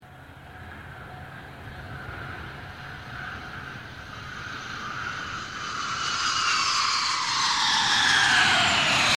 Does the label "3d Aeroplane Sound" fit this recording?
Yes